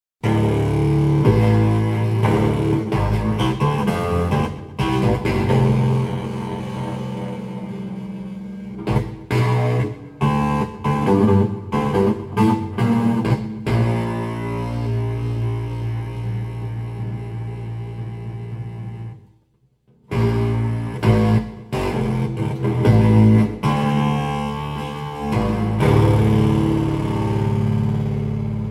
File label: Tinfoil Bass